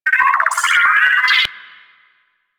Ship Advert Bot Chatter, Corpus Ship; Warframe; Corpus Ship Remaster

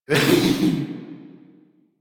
Laugh; Warframe; Alad V Boss; en

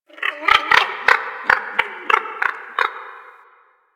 Creature; Warframe; Quests; TheNewWar; Ambience; Drifter Camp; Creatures

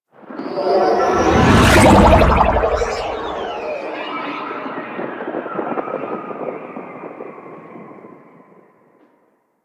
Insect Liset Fly By Slow; Liset Flight

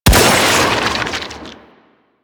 Sniper Rifle Shot; Warframe; Infested

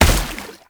Heavy Step Water, Footsteps; Warframe; Tau Dax

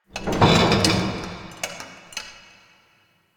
Car Creak; Ambience; Props; Warframe; NineteenNinetyNine

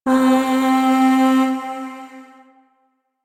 Shawzin Oct Two C; Warframe; Zariman

